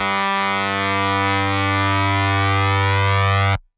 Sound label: Dirty Dutch Lead G2